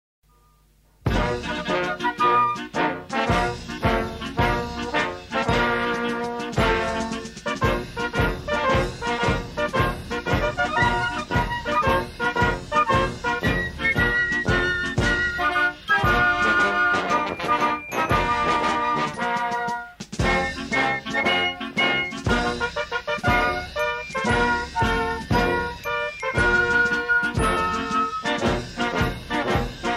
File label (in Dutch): fanfare feest